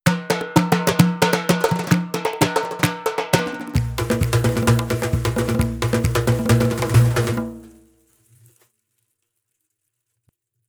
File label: Persian Kit